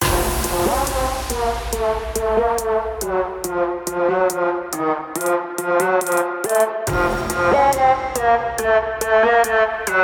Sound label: electric, music box